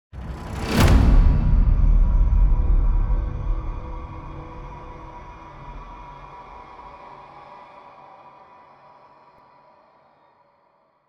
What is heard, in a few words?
Transition To Combat